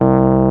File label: Wurlitzer Med C2